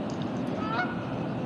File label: House Finch, Haemorhous mexicanus; Pinzón mexicano; Aves; iSoundNat; inaturalist